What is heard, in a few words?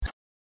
Chip Unpressed; my cute commissar 2020 gamerip